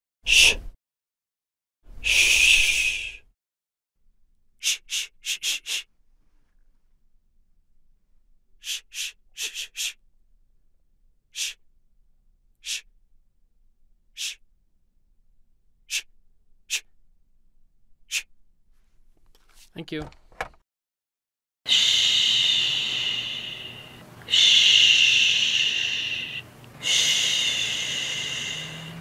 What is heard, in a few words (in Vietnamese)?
Tieng suyt ra dau im lang khong noi chuyen